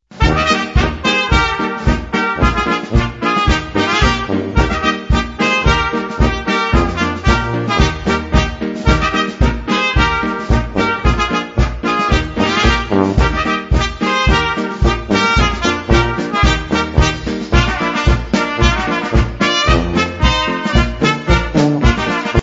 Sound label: POLKA